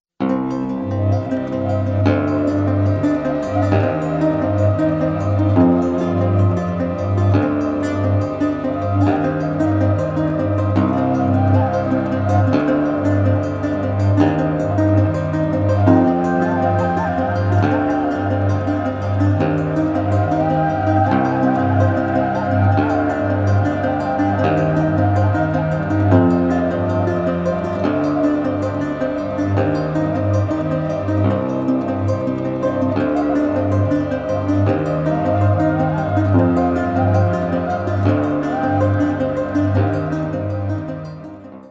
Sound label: Flute; Michael Galasso; Casanova